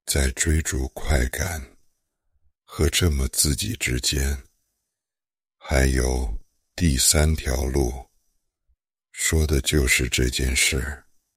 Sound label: drop, remix